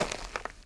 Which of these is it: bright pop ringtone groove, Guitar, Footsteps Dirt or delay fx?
Footsteps Dirt